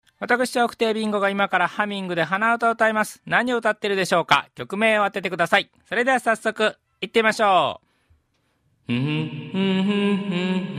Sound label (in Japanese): hanauta